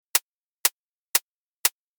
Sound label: hat